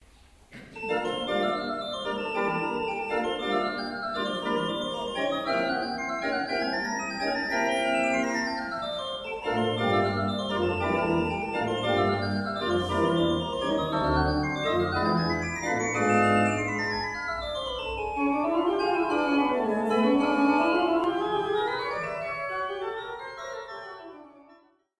pipe organs; Hillebrand

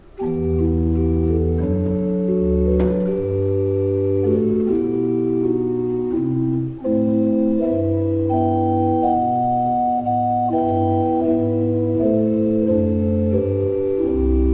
Kleuker, pipe organ

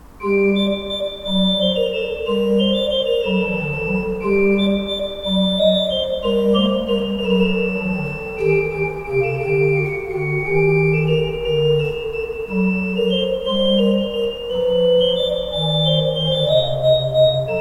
pipe organs; Paul Ott 11 II P

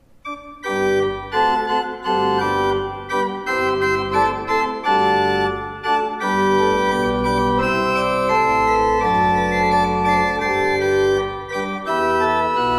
Fleiter, pipe organ

